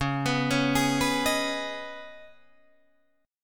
C sharp 7th Suspended 2nd, C sharp, down, Guitar, DADGAD